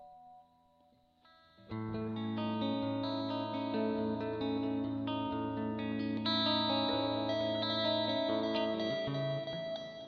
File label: harmonic